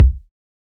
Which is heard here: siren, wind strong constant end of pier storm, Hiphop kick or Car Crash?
Hiphop kick